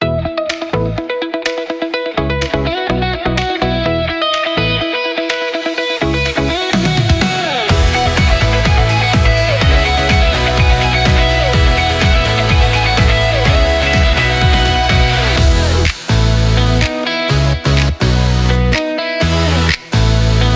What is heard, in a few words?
Upbeat Pop Guitar